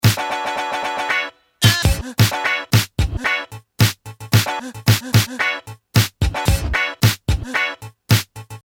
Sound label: loop mono